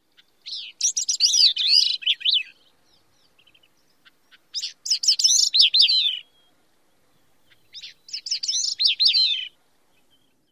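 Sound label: song, gray-cheeked thrush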